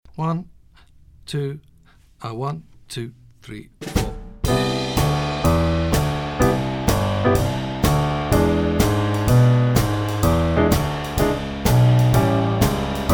Walking Bass Line with rootless chords in right hand, teclado; jazz iniciante